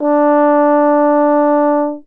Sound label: french horn d4; Horns and Brass